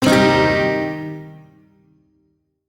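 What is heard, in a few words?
Shawzin Pentatonic Minor Chord; Music; Warframe; Duviri; Chords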